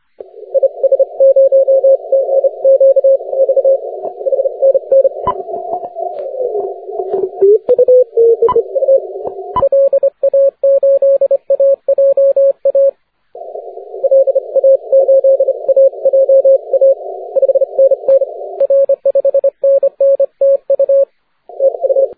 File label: 30m cw